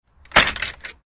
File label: gate